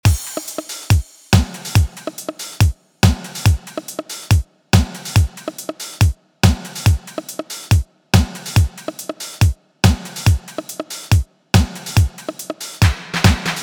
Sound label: DuB; drum; beat; Drum Blaster: The Ultimate Beat Generator, Sampler Plugin for Modern Producers